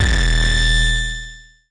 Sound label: nasty stormfront, Electro FX